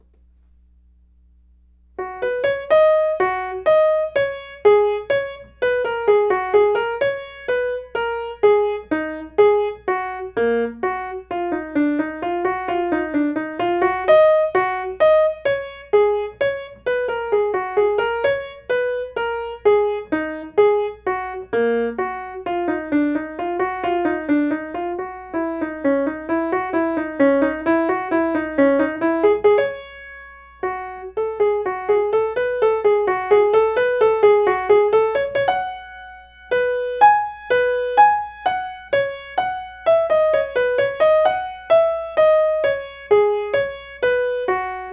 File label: Brahms Waltz; ear training level 3